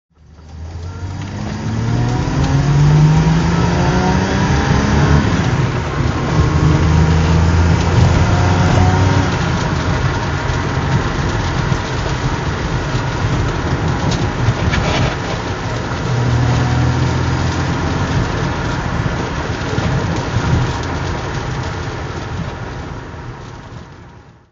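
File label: datsun 240z drive, engine sound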